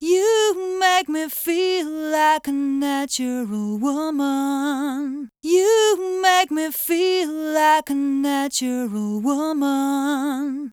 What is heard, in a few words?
API 550b; eq; liquid mix